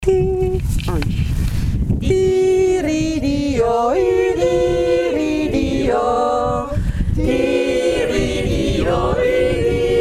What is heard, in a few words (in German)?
dachsteinjodler